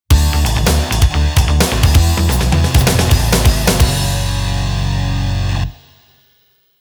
Failure; Ace Combat 2

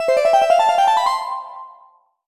Jingle Result

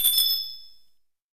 Menu Pause; Lalaloopsy Carnival of Friends

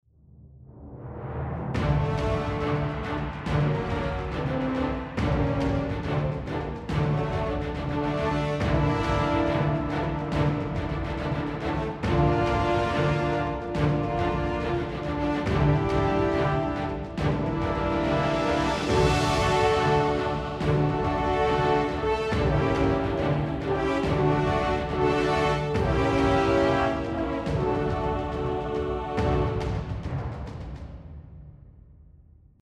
Kill Spree Tension

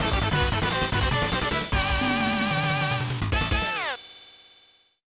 End Battle; saturday night slam masters snes

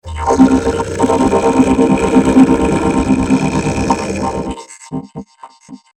Cave Guardian Final Stretch Hungry

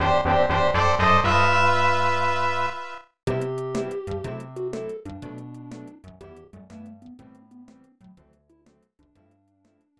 Bonus; Sim City; snes

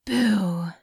Boo

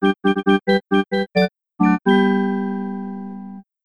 Race Fanfare; B3313; n64

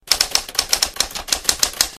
intro Typing; Pokemon Reborn

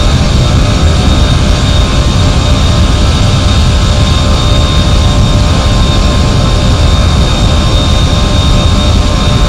Jetcar High

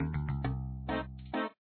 Customer Worried; papas cupcakeria online windows gamerip 2013

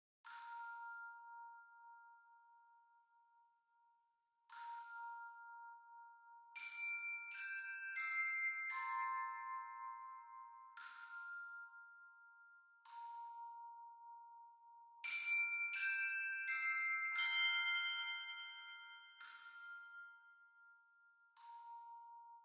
musicbox; Sky Children of the Light gamerip

